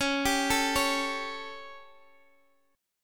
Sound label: A 7th Db, chord